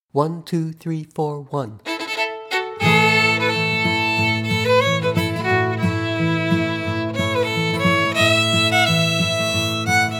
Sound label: Fiddle Im Going Back to Old Kentucky; Jack Tuttle’s Bluegrass Fiddle Collection; two versions, my arrangements, A